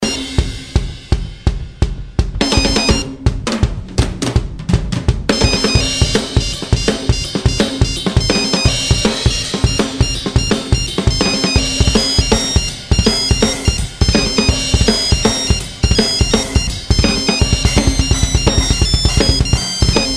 Mono Nokia

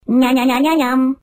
Nice Sms Sound

